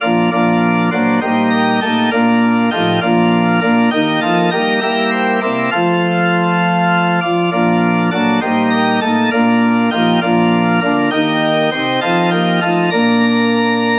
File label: midnight clear, organ